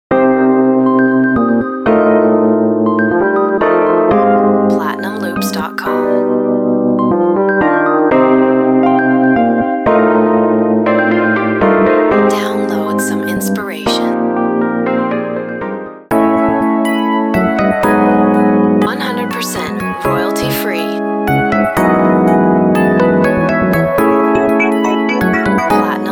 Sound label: keys